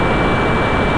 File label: Misc Afterburner; A-10 Attack! Cuba Demo